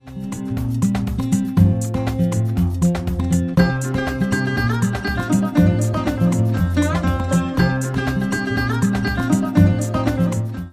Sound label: Experimental